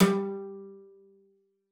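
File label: CELLO spiccato G3; 90 s Sample CDs; Xsample VOL-5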